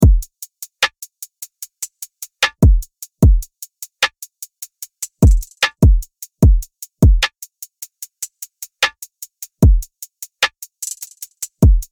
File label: Drums played together